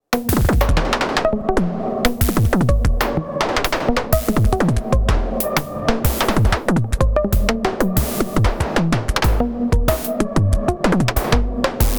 nordDrum

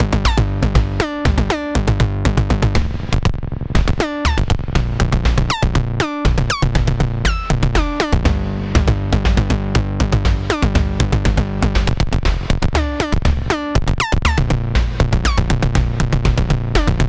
nord Drum 3

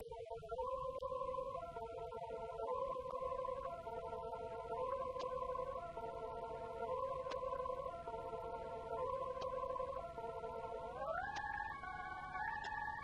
microKORG SDD-1000

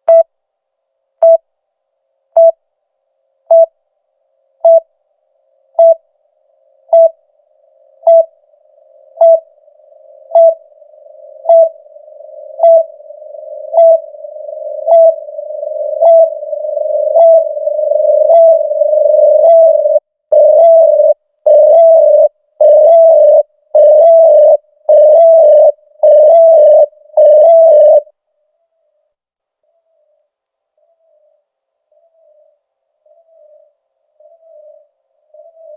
DMD2000 feedback loop

